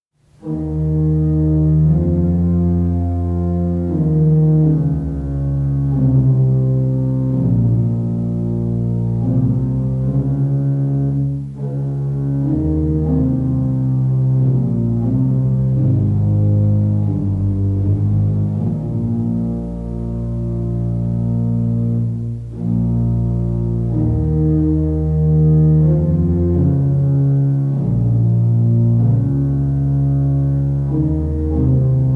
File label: French Organ Music; vierne